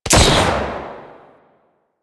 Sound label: Sidearm Shot; Weapons; Warframe; Tenno; Wraith Sidearm